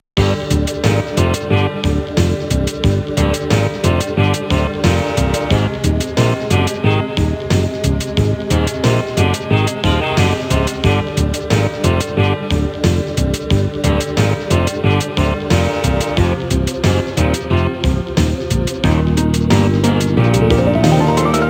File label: Gears intro; Arelite Core